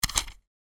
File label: flip start